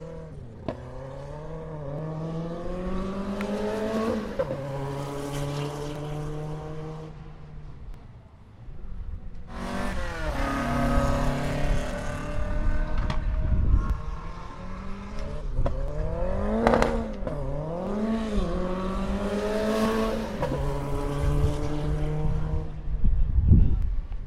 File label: Rally Cars